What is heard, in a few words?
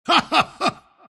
laugh; dota; omniknight